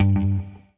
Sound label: ROCK, Guitar; 90 s Sample CDs; Quantum Leap - Guitar & Bass